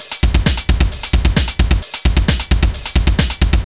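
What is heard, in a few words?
drum loops, left; Big Fish Audio; Prosonus 500 Drum Loops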